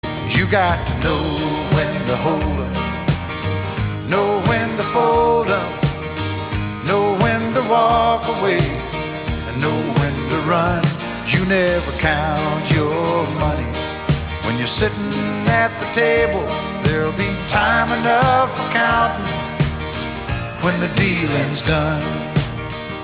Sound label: 08kHz AA, Aliasing Examples